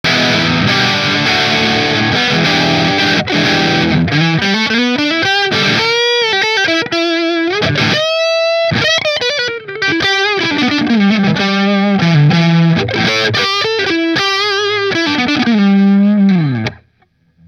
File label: Robin Avalon neck marshall, guitars